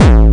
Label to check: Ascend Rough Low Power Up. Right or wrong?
Wrong